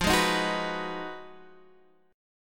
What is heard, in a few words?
B 6th F, guitar chord; B6/F; strummed